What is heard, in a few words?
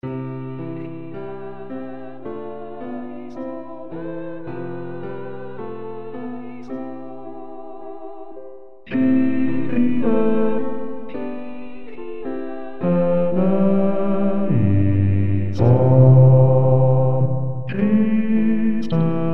bass kyrie; gounod